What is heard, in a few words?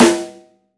big room steel snare drum sample f key, snare drum sound tuned to the F note